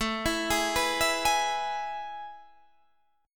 A Major 7th Suspended 2nd, Guitar, Standard; AM7sus2 arpeggiated; JGuitar

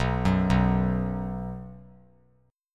B 5th B, chord; arpeggiated; x,6,9,9; JGuitar

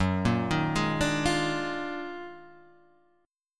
D sharp Minor Major 11th F sharp

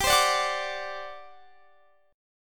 G Major 7th Suspended 4th; GM7sus4 strummed

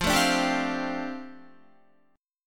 F 6th Add 9th F down Guitar, chord

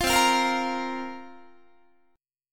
C 7th Suspended 4th, Guitar, chord; C7sus4 strummed

